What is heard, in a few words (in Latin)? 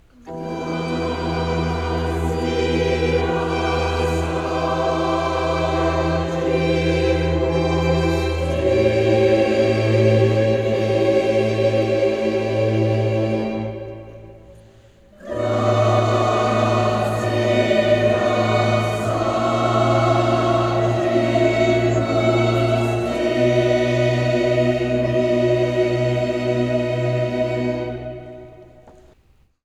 gratias agimus tibi, Vivaldi; Choir; 2025 spring concert